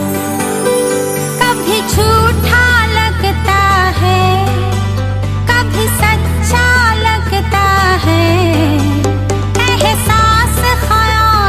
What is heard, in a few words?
Female Bollywood Ringtone